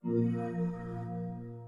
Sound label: ps5 login